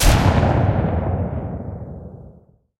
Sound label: mg131 shot; guns; War Thunder